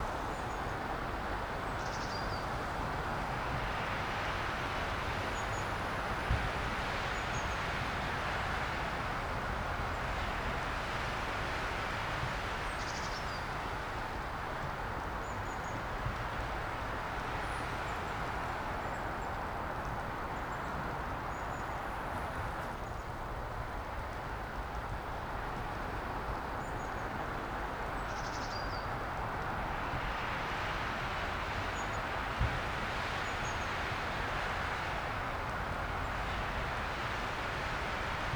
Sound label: Traffic Noise Slow Cars in the Rain